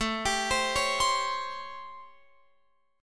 A 7th Sharp 9th, Guitar, Open F; A7#9; arpeggiated

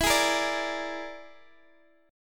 E 7th Flat 5th, down, Guitar, Open G

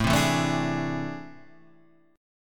A Minor Major 11th, down, Guitar, Standard; strummed; AmM11; JGuitar

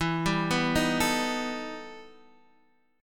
E Minor Major 11th, Guitar Half Step Down

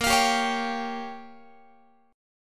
Bb Minor 6th, Guitar; strummed; x,18,20,17,17,18; 4A,4E,5A,5D,5G#,6C#

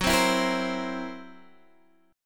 Fsharp 7th Flat 5th down Guitar Standard; strummed; JGuitar

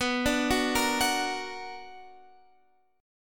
B Minor Major 7th; BmM7 arpeggiated

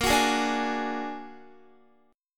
Bb Augmented 7th Bb down Guitar 4E,4A,5D,5G,5C,6F; Bb+7; strummed

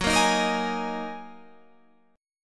G sharp 7th Suspended 2nd F sharp

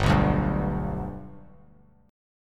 G Major G down, chord; G strummed; JGuitar

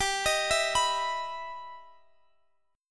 E Minor Major 7th G

